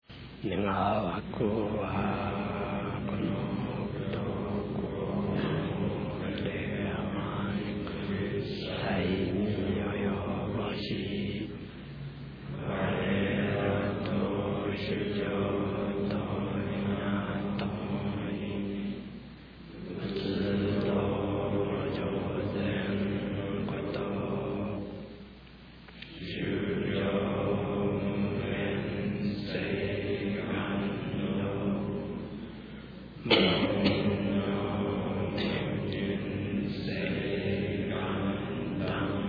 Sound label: end chant